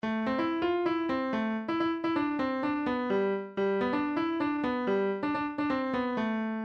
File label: russian lezginka, midi